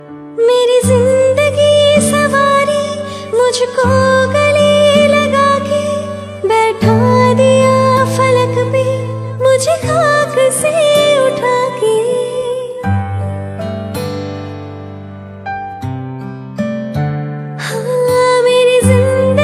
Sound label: Female Version Ringtone